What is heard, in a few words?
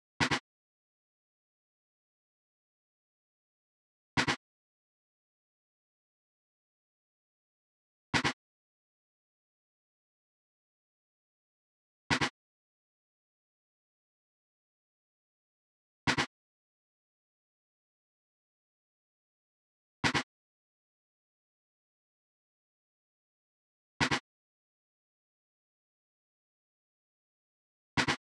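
Snare Roll 121BPM C major Real Bouncy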